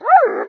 whine, dog; There; ThereClient

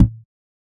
Tom Electrified; One Shots; Drums

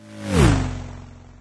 drink; There; ThereClient; smokinsour

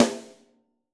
Snare Brush Hit Hard Club Sizzle, drums

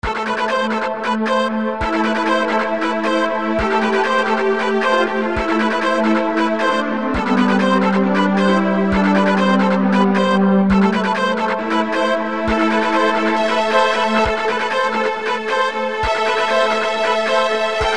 bass

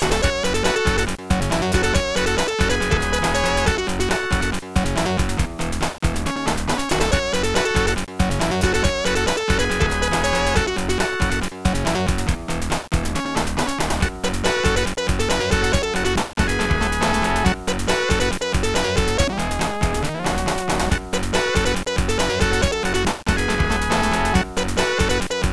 cool music; Sonic

